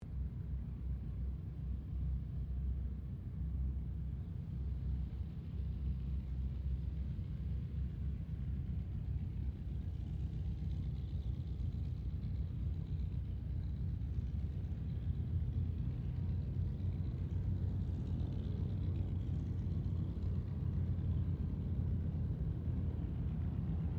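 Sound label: AIRCRAFT TROJAN SQUADRON 4 TAXI SHUTDOWN; Mixed aircraft squadrons; Airplanes; Vehicles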